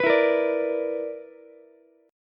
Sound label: B-Minor Major 7th Double Flat 5th B down Ukulele; BmM7bb5 strummed; JGuitar